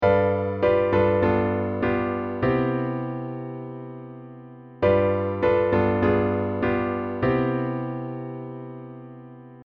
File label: Tension Resolve